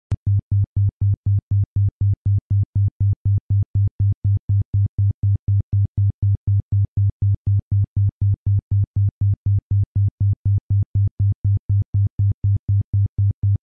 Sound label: 4 to 6.9 Hz Theta Isochronic Tone